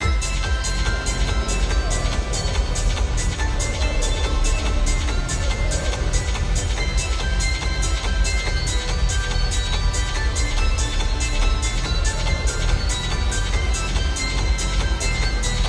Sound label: Karaoke Lounge Ambient BGM; Kurohyou Ryu ga Gotoku Shinsho